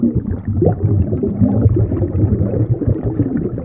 lava flow; The Elder Scrolls III - Morrowind

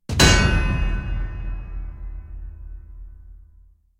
Anvil hits; SyrinScape; Missing Magistrate

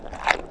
eating; The Elder Scrolls III - Morrowind

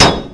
Heavy Armor Hit; The Elder Scrolls III - Morrowind

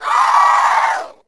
scrm

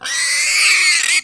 moan, boar; The Elder Scrolls III - Morrowind

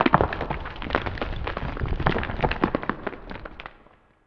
rocks; The Elder Scrolls III - Morrowind

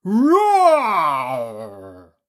dino roar

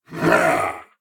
Combat; Eshimal; SyrinScape; Snarl and Oraculum

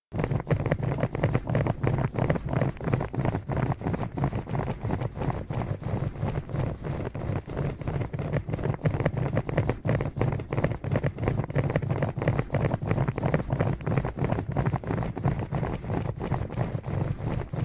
Running city guard; SyrinScape; City gone mad

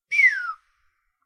Whistler bird

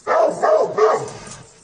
Big vicious hounds; SyrinScape; Missing Magistrate

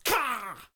Goblin Injury; SyrinScape

